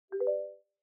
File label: start sound